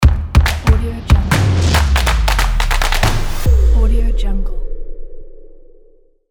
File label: dynamic logo